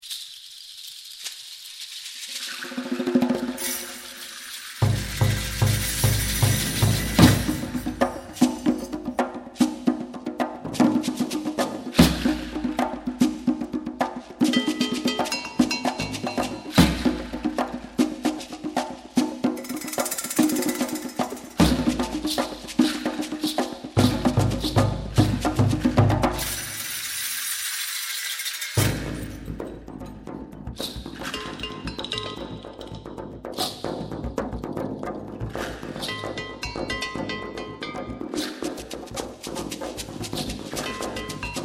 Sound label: Tar